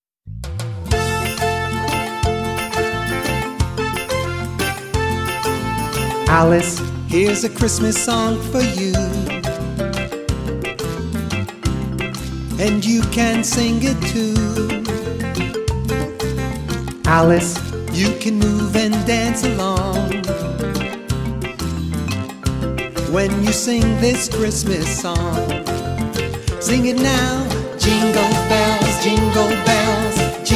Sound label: Jingle Bells